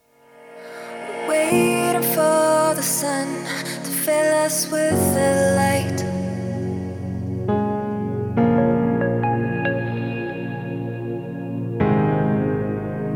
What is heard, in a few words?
EDM